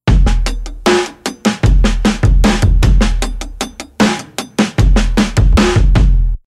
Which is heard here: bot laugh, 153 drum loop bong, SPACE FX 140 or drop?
153 drum loop bong